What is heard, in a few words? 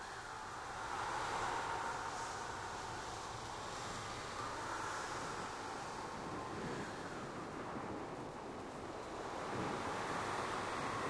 entrance, undead tower